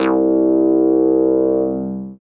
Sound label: synth acid, dance synth; Sound & Vision - Gigapack I CD 2 (Roland)